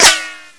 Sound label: ric, weapons; hl2